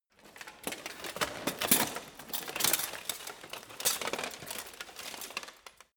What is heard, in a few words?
get up